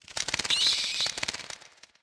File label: bat; World of Tanks Blitz; karelia halloween